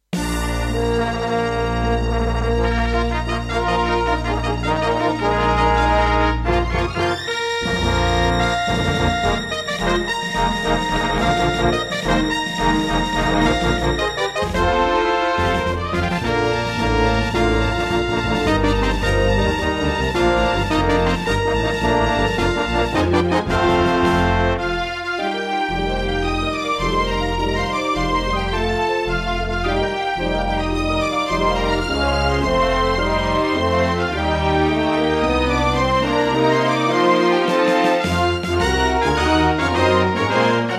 Intro, Roland SC-88 Pro; Dark Forces

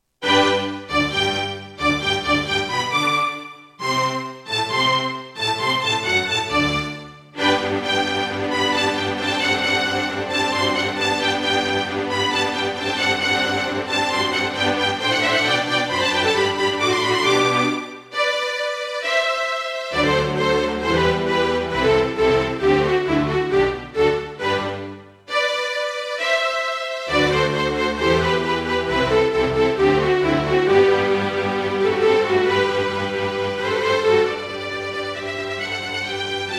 Roland SonicCell GM2 TEMPLATE; Mozart - Eine Kleine Nachtmusik partial; classical

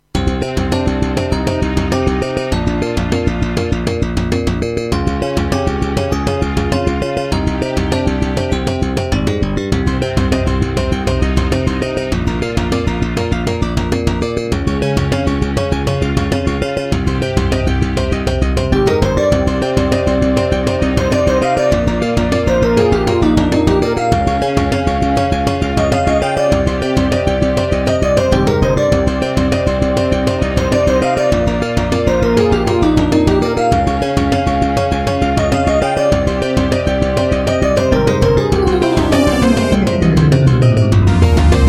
Korg X5DR; Hocus Pocus

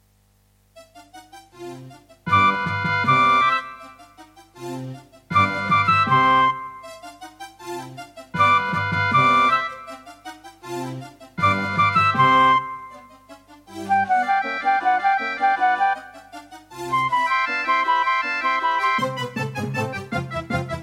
Casio CTK-2100; classical; Mozart; Concerto K467 partial

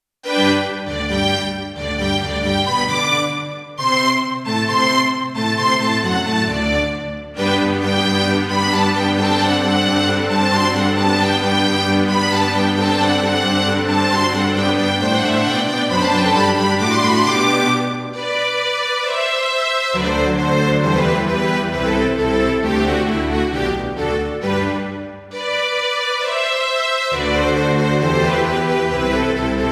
Roland JV-1010; classical; Mozart; Eine Kleine Nachtmusik partial

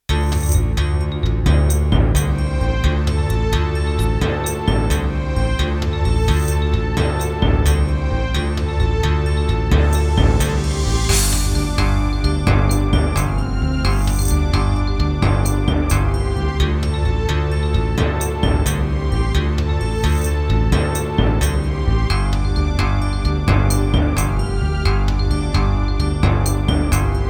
Serdaco Dreamblaster X16GS NATIVE, wavetable; Heretic

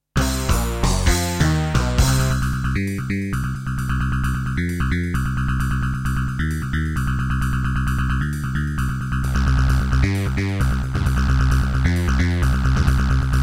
Doepfer GMX-1; sigil doom episode 5

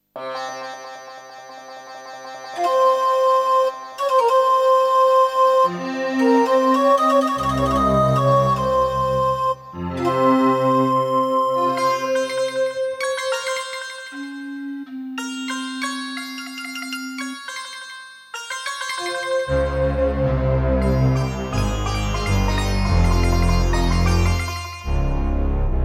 Serdaco Dreamblaster X1; Prince of Persia 2 - Intro

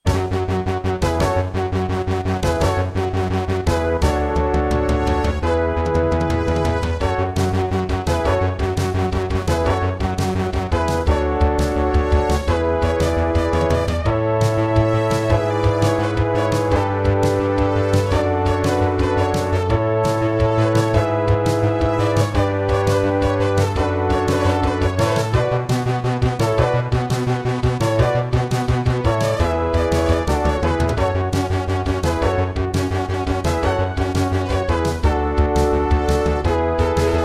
Intro, Korg NS5R MT32 Mode; 4D Sports Boxing

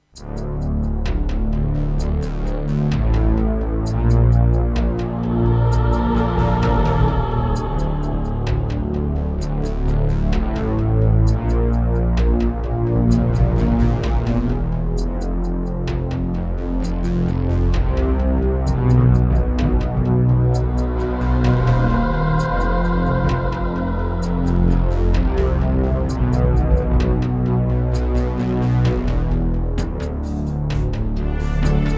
Korg NS5R; Descent II

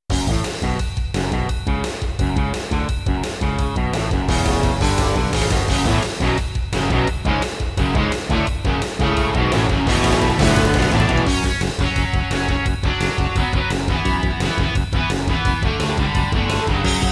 Intro, Roland SD-90 GS MODE; Duke Nukem 3D

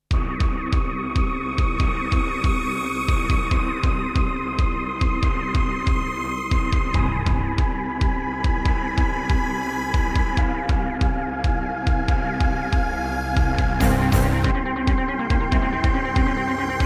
Korg NS5R; sigil doom episode 5

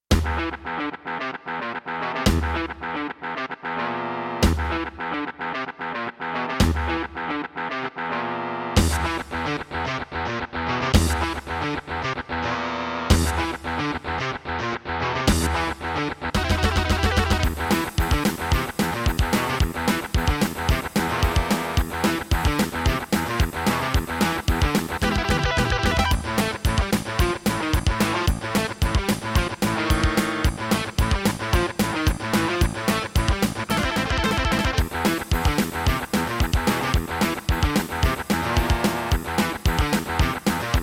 Roland SC-88ST Pro 55MAP; Doom

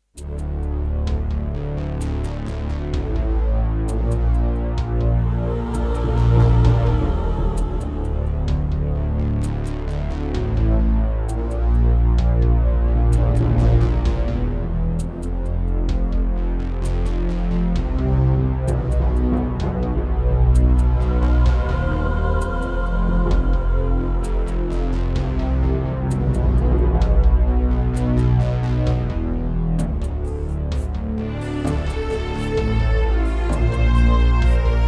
Roland SC-88 Pro 88MAP; Descent II

